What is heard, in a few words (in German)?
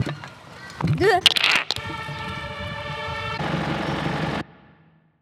Space Sound